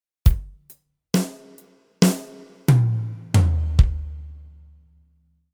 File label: no ghost, drum